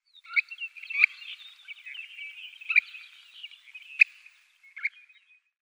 Grosser Schlammlaeufer Limnodromus scolopaceus; Vogelstimmen

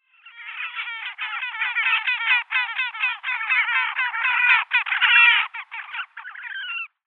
Ohrentaucher Podiceps auritus

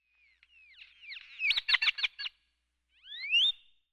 Dohlengrackel Quiscalus mexicanus; Vogelstimmen

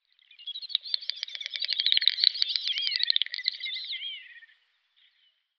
Doppelschnepfe Gallinago media; Vogelstimmen

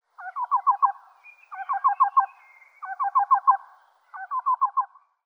Schwarzschnabelkuckuck Coccyzus erythrophthalmus; Vogelstimmen

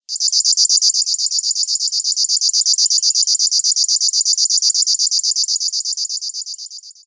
Schlagschwirl Locustella fluviatilis; Vogelstimmen

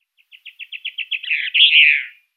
Teydefink Fringilla teydea, Vogelstimmen

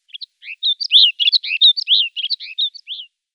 Schneeammer Plectrophenax nivalis; Vogelstimmen

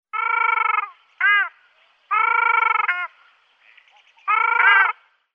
Rostgans Tadorna ferruginea

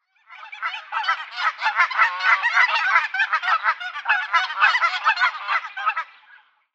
Kanadagans Branta canadensis Rufe mehrere; Vogelstimmen

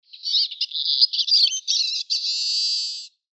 Erlenzeisig Carduelis spinus; Vogelstimmen

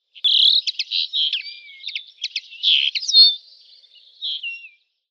Bluthaenfling Carduelis cannabina; Vogelstimmen

